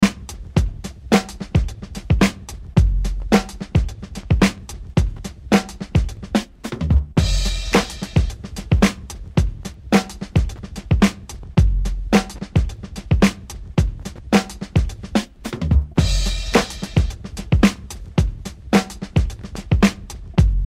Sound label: Break